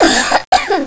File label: cough; Dynamite